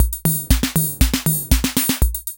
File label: FILL, KR55; INIS; Funky Xtreams